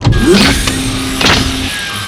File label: delorean hover on